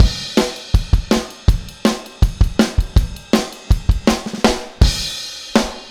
Take Your Toms Off - Chorus - Full Mix, Drums, 162 BPM; Drums from the Big Room The Mixes; Chorus 05